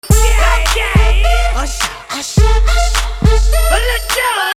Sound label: Yeah Synth; minor scale real songs quiz